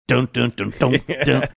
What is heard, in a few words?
singing few notes; home depot ad song